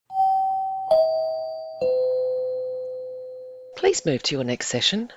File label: three chimes descending please move to your next session